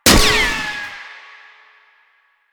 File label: Shield Impact; Warframe; Lasrian; Lasrian Tank